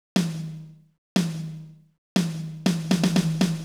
Tom Hi; hydrogen

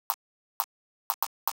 Click; hydrogen

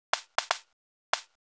Clap; hydrogen

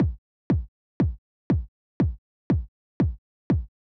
Plastic BD; hydrogen

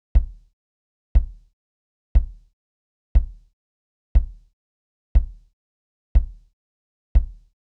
Kick; lmms; madmans

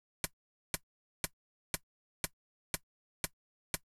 TR909 Closed HH; hydrogen

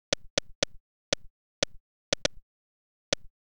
Zap; hydrogen

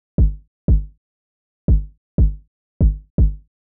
909 x-over; hydrogen

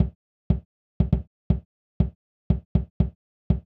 Kick; hydrogen

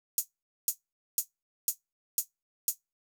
808 chh; hydrogen

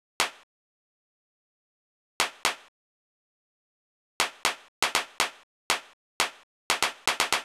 xp909 clap; hydrogen

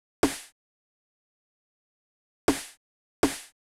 TR909 Snare; hydrogen

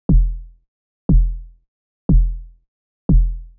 VariBreaks Kick; hydrogen

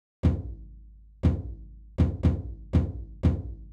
Floor Tom; hydrogen

